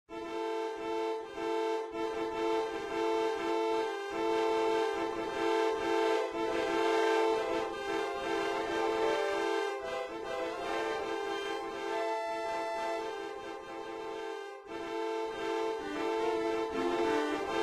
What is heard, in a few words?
honk song; DELTARUNE